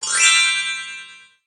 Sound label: freespin end melody; slot game; Hot Choice